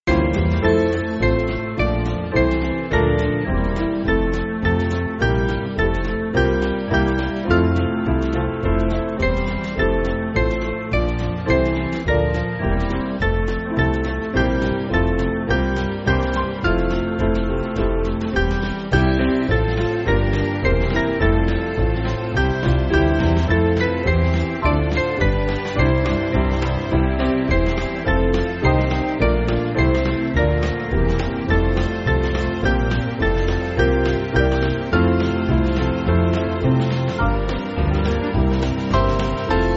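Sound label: Band, Backing Files for Churches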